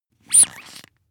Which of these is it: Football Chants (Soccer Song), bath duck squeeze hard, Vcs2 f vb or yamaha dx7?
bath duck squeeze hard